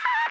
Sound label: radio random, prison; hl2